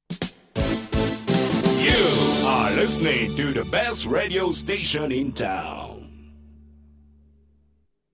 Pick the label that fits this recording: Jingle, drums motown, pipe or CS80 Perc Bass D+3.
Jingle